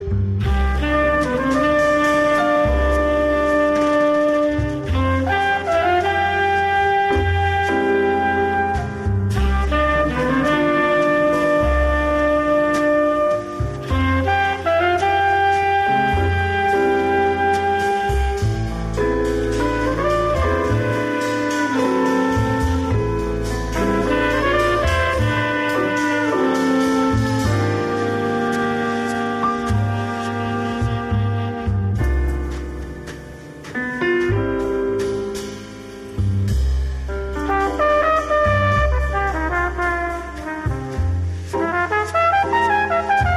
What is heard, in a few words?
Noire Ambience; l.a. noire the lost tracks